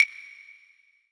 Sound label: Claves; MARKYDRUMS